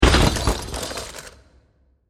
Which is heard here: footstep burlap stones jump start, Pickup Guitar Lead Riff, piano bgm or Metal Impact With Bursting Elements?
Metal Impact With Bursting Elements